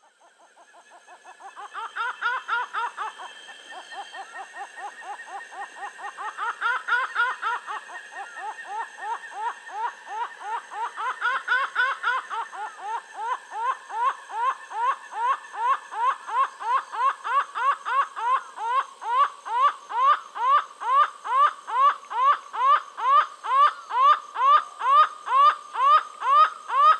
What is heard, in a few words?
Laughing Falcon (Herpetotheres cachinnans), Venezuela; S. America